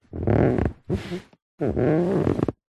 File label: vehicles car driver seat body movement creak